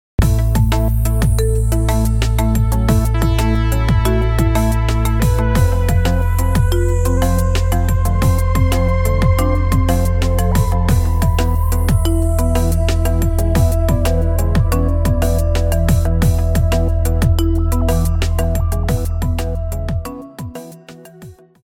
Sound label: Bell Bell Bell